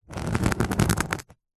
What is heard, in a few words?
small sharp spike scratch carpet; foley